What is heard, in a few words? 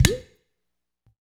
UDU, African